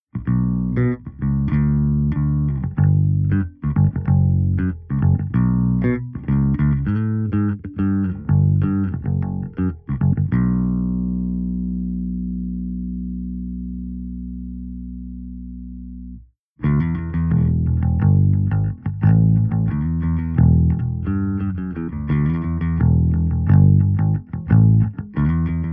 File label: SVT FLAT